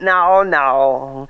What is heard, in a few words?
no no redneck guy SUNG; Voice